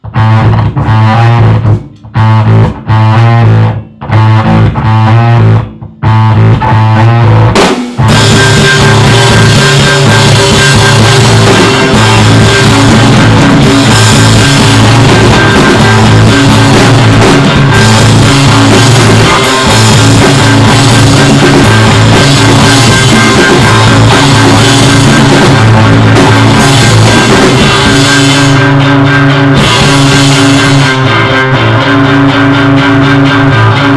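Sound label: Intro Riff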